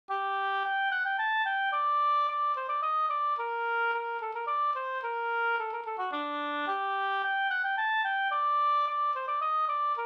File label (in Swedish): polska Bodapolska